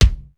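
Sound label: tight double; sr16 bd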